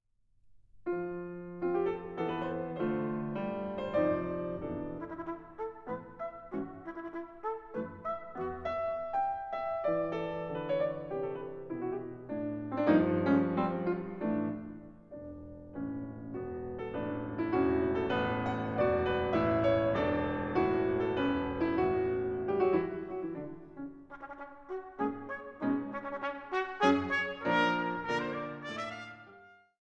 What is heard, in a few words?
Sonata